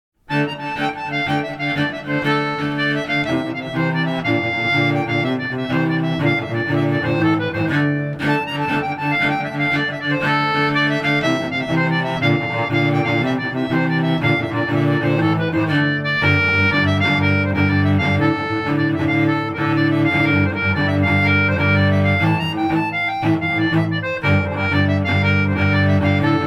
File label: concertina